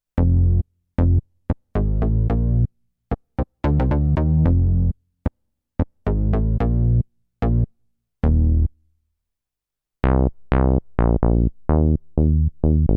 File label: Roland Go Keys Bass